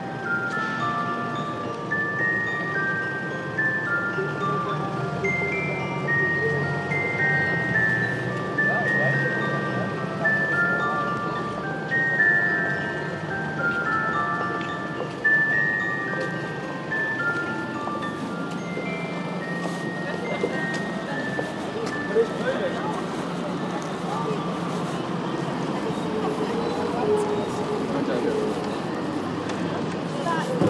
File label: sounds of selfridges xmas windows